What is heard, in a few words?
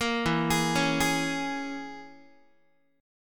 E 6th Flat 5th E down Guitar; E6b5; arpeggiated; 13,12,11,11,12,x